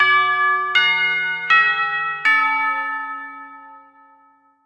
Jingle Start; Nishinippori; Dandy Dungeon Legend of Brave Yamada

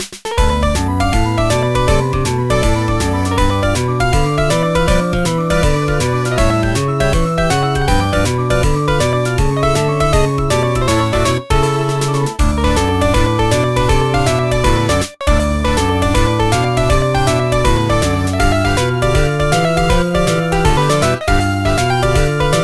More Simple Folk; Gyakuten Saiban 2; GBA

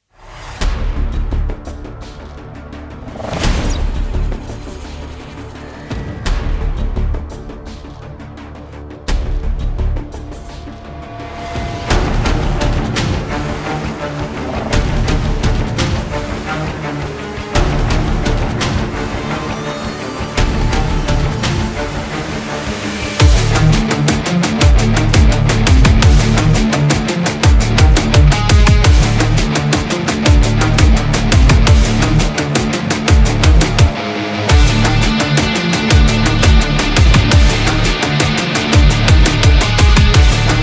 Halloween Music

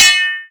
miss; shape matcher

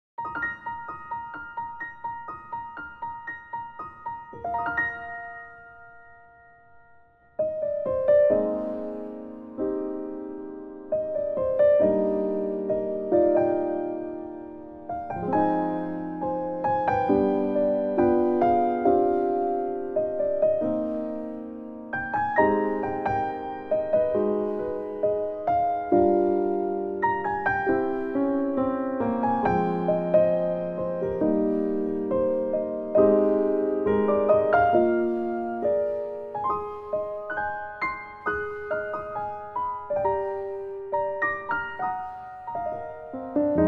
EndingTheme PianoVersion; Refind Self The Personality Test Game

